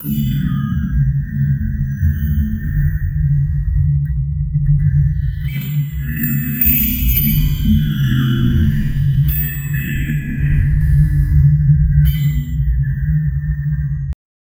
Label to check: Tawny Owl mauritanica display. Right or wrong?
Wrong